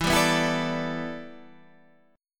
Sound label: E Minor 11th E down Guitar Standard; Em11 strummed; JGuitar